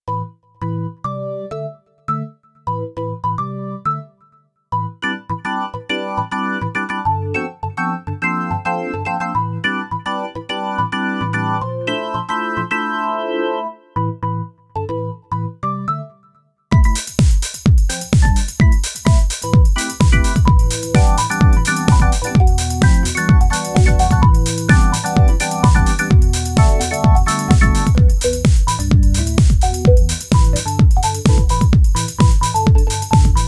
House Prc Orgn, Korg Radias-R

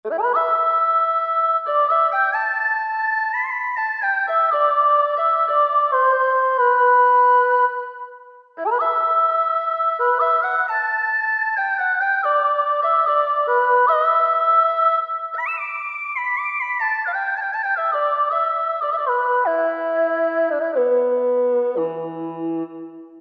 Erhu Lead; World; Roland SR-JV80-05

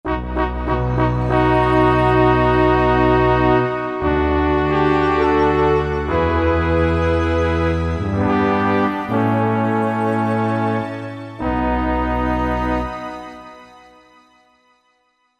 SuisseHorn

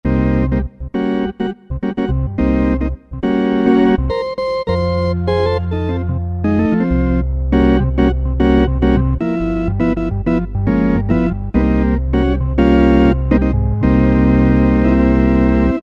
50's Organ, Roland SR-JV80-06 Dance

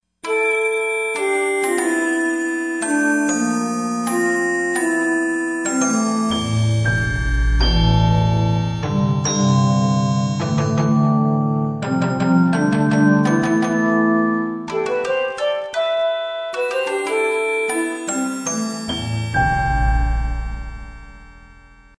Yamaha TG55; Factory Presets; Gamma Band

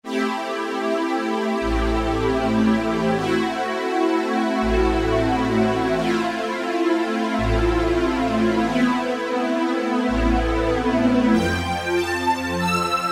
Strings, Alesis QS8; Factory Preset examples

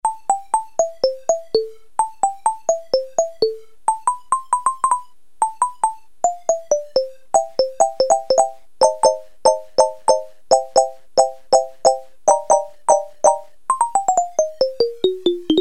XYLOPHONE, Casio CZ-1000

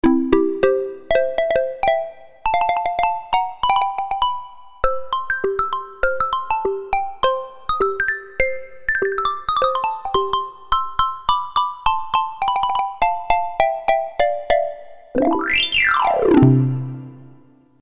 Xylophone, Roland MKS-50; Factory examples

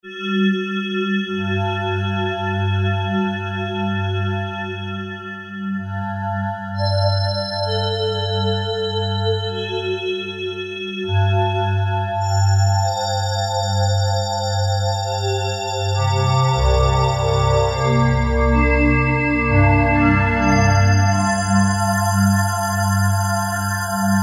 Glasswaves, Kurzweil K2000; Kurzweil K2000 V3; Factory Programs

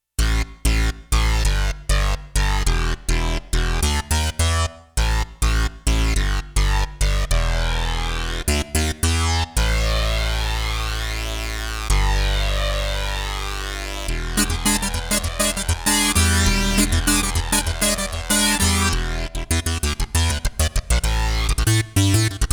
Syn Bass & Sawtooth, Roland JX-1; Factory presets; Dual patches

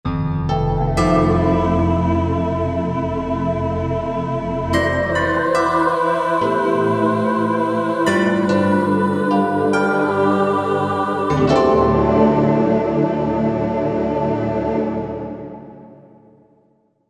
Magical Pno

